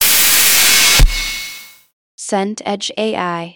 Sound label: shield hit